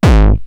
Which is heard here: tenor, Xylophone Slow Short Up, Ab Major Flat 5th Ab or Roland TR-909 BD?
Roland TR-909 BD